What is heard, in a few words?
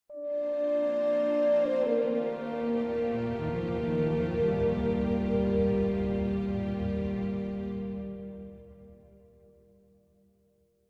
Location Jingle New Sub Region; theHunter: Call of the Wild